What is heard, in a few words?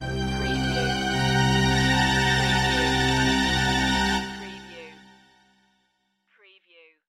Stab Strings